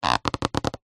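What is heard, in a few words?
leisure inflated rubber toy stress squeak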